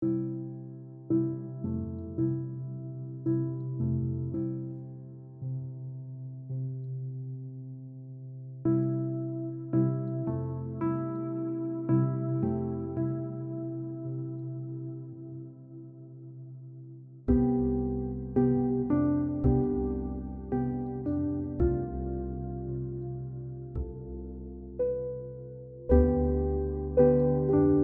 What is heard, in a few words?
Indie Light